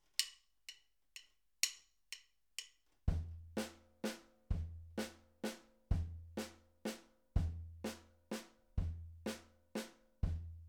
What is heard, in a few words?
drums